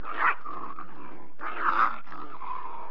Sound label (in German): hund klei